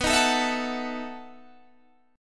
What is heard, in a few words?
B 11th B Guitar Open G, chord